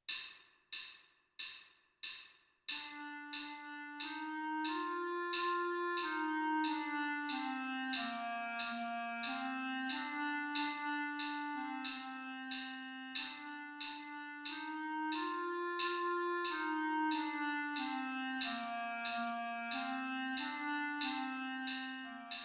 bass clarinet

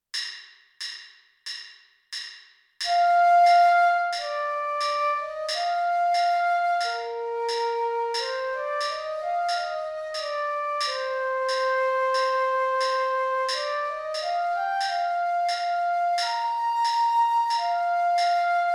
flute

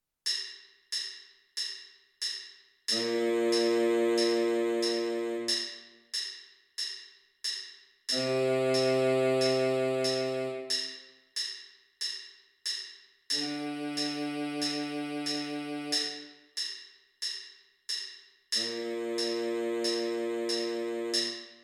band on parade baritone sax

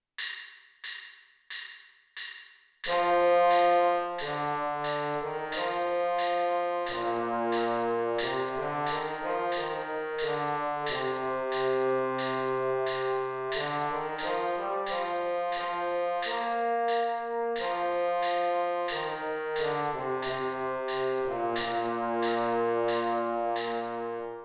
trombone baritone; the conquering hero

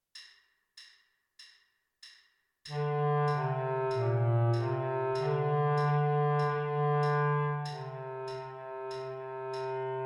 bass clarinet

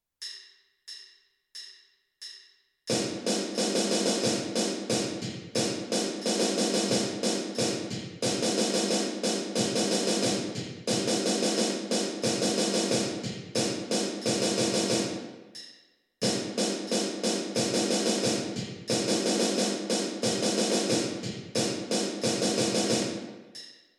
major scale snare drum bass drum

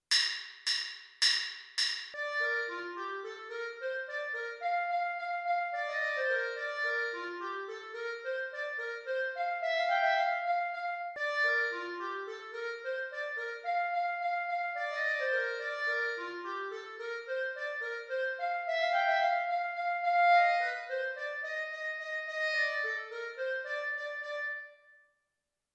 clarinet only; finale

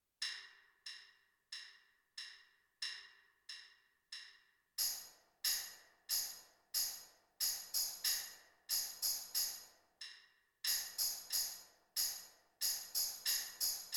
auxiliary percussion

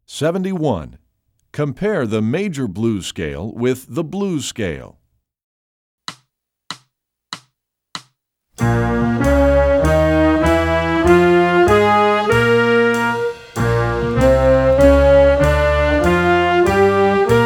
compare the blues scales

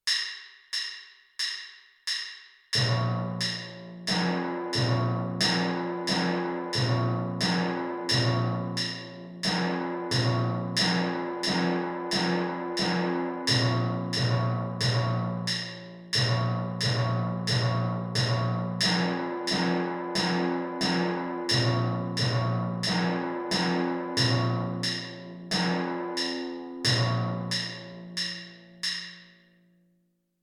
major scale timpani

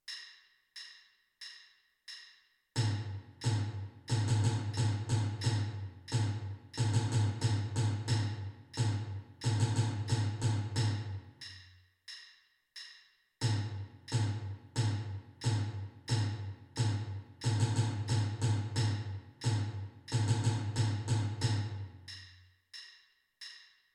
snare drum bass drum; the conquering hero

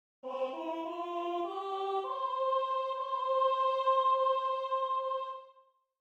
soprano

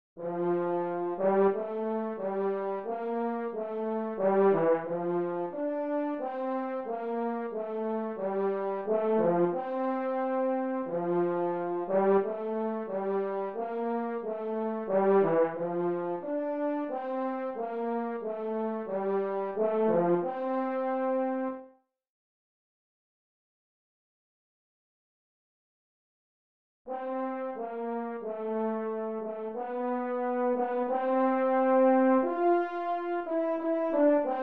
hymn for french horn french horn only; emperors hymn

